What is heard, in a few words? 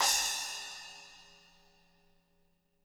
SPLSH, SPLASHES